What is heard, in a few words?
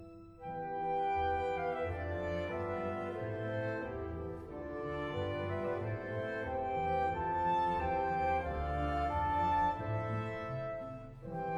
crescendo; Cours education musicale; Voyage en Russie